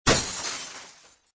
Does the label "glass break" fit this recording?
Yes